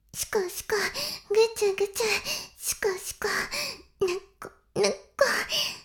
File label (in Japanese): しこしこ音声, ASMR; 白くて黒い魔性円環